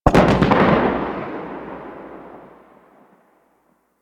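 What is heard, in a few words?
Gas Distant Cloud Explosion; Ambience; Warframe; Corpus Gas City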